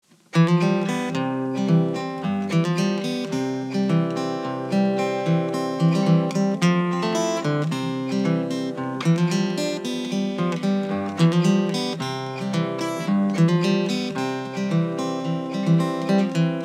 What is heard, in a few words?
Slack key instrumental